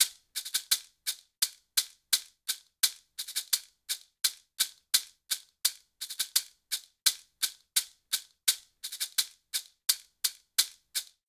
Guajira Maracas 85bpm; Latin Percussion; Ableton; Factory Packs

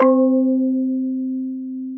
Chime Blues, Mallets; Instruments; Tension; Ableton; Synth Essentials

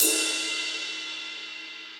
Ride, drums; Cold Tight Room; Drum Hits; Ableton; Session Drums Studio; MPE Hits

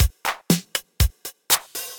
Kit Room, effected drum kits; Drum Machines; Ableton; Factory Packs

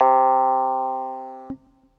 Suitcase Clav Stack, Electric Keyboards; Ableton; Factory Packs

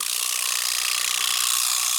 Vibraslap Pan; Latin Percussion; Drum Hits; Wood; Ableton; Factory Packs

